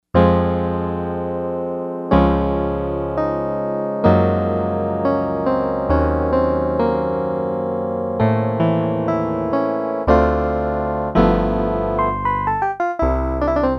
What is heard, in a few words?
Yamaha DX100; Factory Patches; IvoryEbony